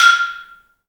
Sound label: balambo, balafon; Heart of Africa